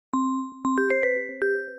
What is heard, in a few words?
samsung bell samsung galaxy bell samsung galaxy